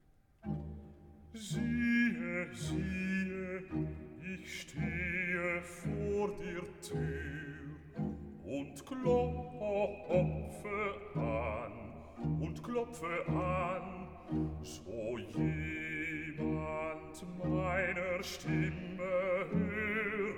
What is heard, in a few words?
Recitativo; BWV 61; Nun komm, der Heiden; Siehe, ich stehe vor der Tür